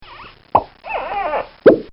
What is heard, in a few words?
Squeaks And Pops; Funny Musical Sound Effects; recorded in a custom built sound booth